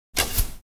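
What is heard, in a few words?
Shooting a bow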